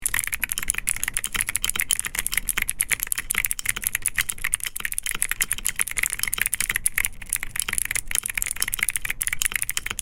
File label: clicky